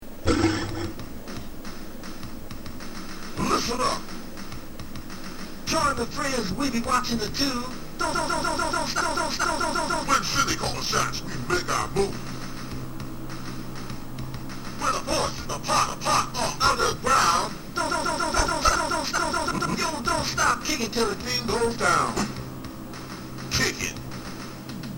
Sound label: rap; brute force